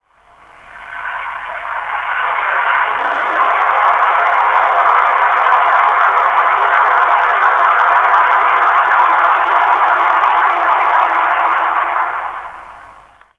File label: engine start; vampire